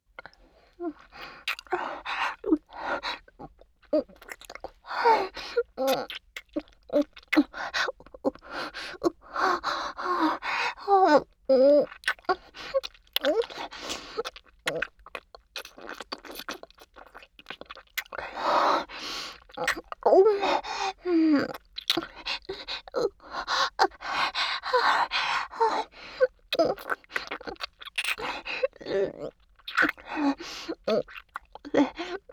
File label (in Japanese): ベロチュー&漏れ出る喘ぎのセックスループトラック, ASMR